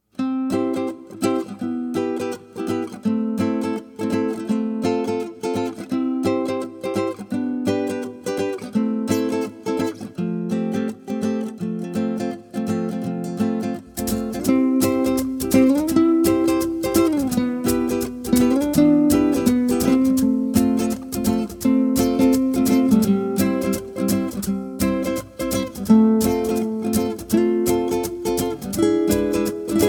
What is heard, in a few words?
Somewhere w perc